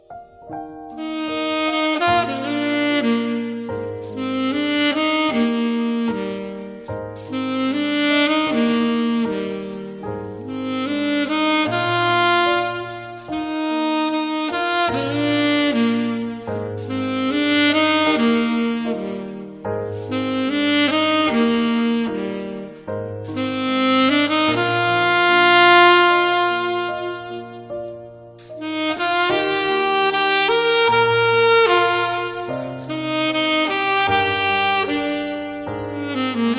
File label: Saxophone Cover